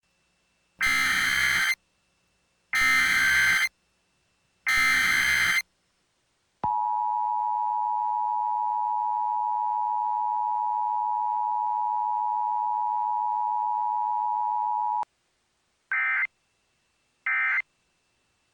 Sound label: emergency alert system headers